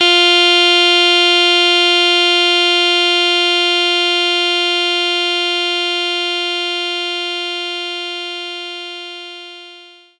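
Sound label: Alto Sax F4; knights try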